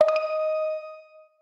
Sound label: pop, notification; Sony Xperia C